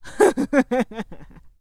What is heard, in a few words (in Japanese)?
warai